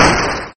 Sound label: Explosive bang; gridtracker